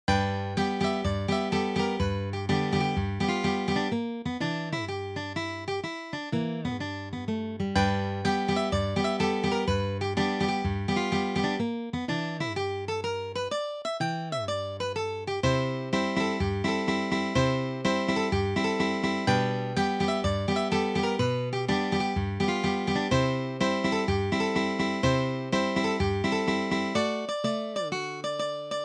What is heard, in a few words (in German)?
esel blues, Blues Album für Mandoline und Gitarre